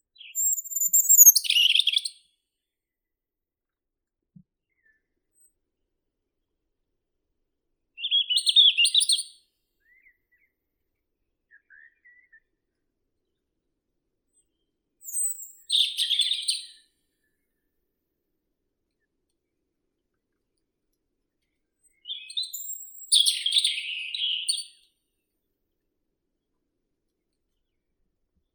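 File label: robin Erithacus rubecula, nature sounds; malinovka; zaryanka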